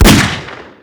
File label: 357 shot, weapons; hl2